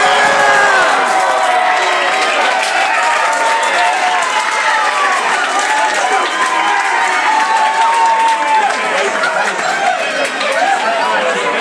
British pub goes mad; mo farah s 5000m gold

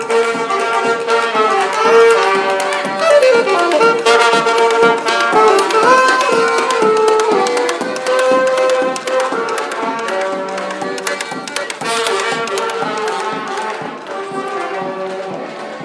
jazz quartet busking in sandell street waterloo

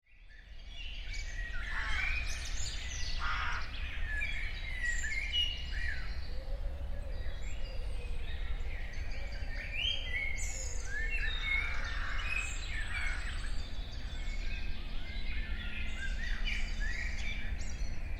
a peaceful Swiss forest soundscape

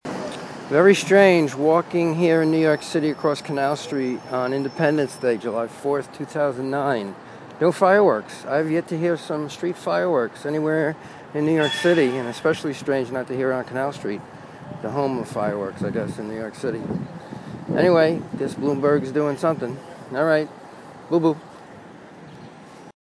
fireworks; nyc

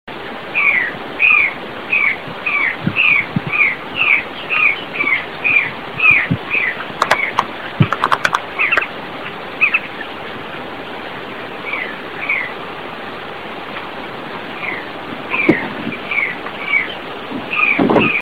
pia pia cyanocorax morio

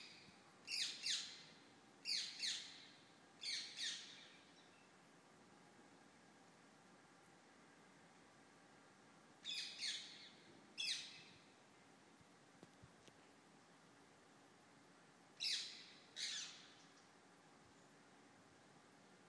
bird parrot call

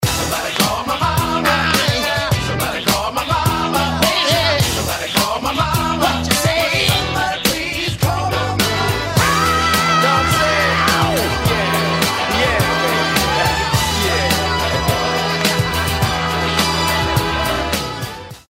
contest sounder

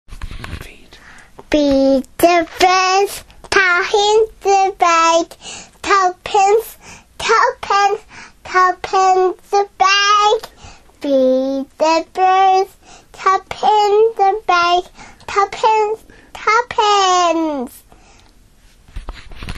singing

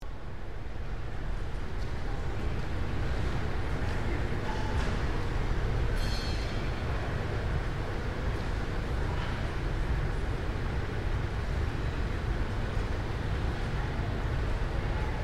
museum drone ambience

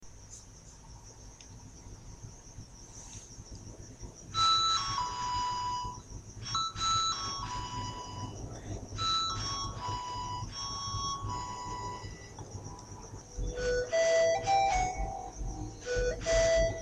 ocarina practice

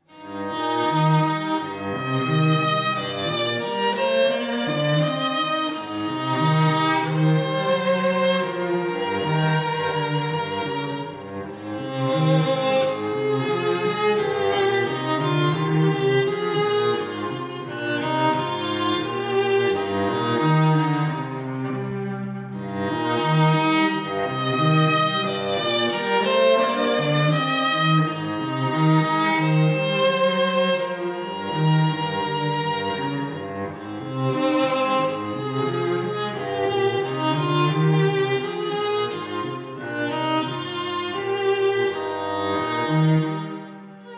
string duo, wedding ceremony; toast string duo

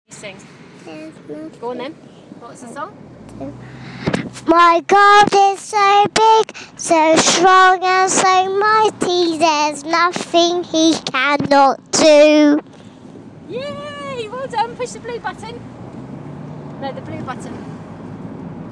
song from assembly

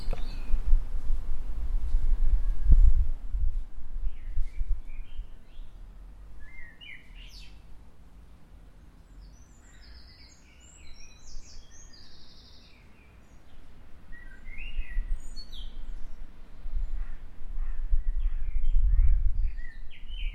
back garden blackbird

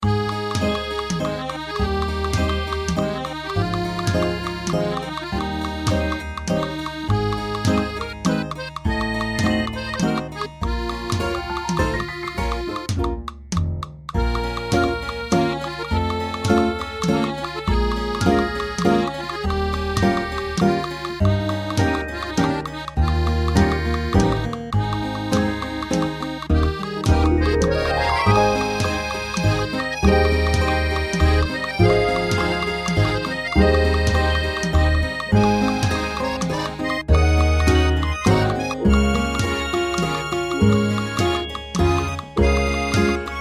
3 4 waltz

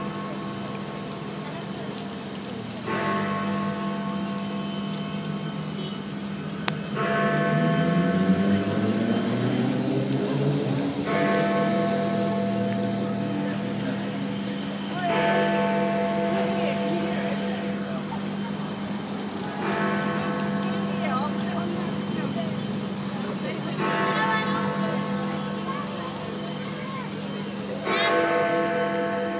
olympic bells

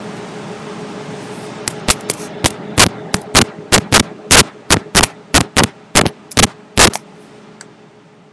tapping test

